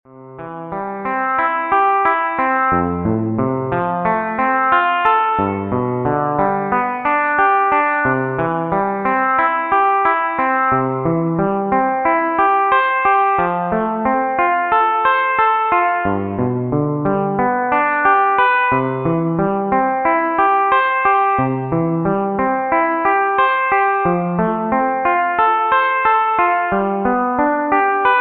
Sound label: Arpeggio C 90bpm; CAGED